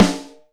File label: Snare, Alesis DM5